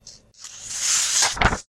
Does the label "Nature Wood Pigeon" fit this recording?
No